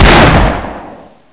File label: m14ebr shoot, weapons